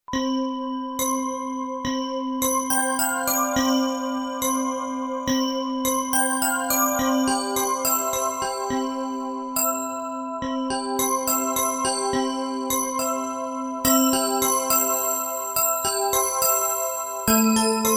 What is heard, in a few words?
MUSIC BOX